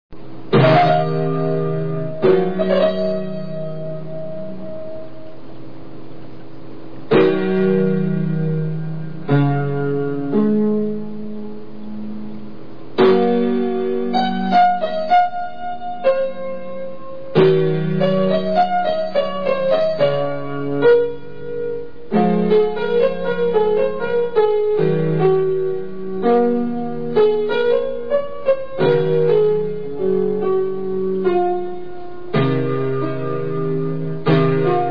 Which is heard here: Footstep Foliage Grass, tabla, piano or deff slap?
piano